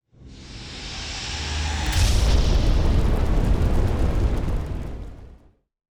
planet thrusters; Stellaris; extreme frontiers

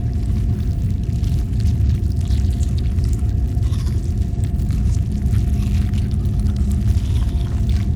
ship transport idle; biogenesis

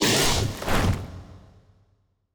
cutholoids attack; Stellaris; grand archive; ships

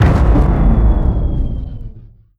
disruptor fire, weapons; Stellaris

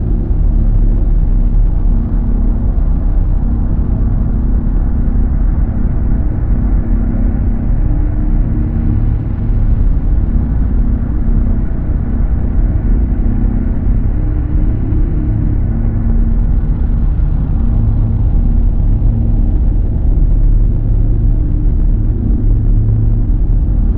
colossus idle hum; nemesis; Stellaris

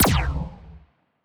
anti fire rate laser muzzle, weapons; biogenesis

